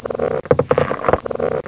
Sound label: vine squeek